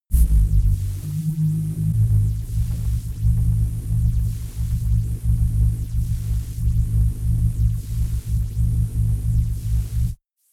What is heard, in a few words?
drone synth bass sizzle; musikelemente